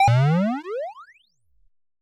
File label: Retro Jump; Bounce Jump